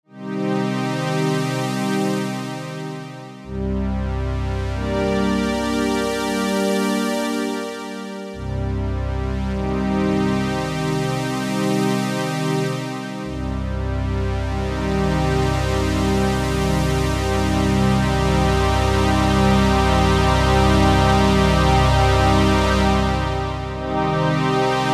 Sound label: Strings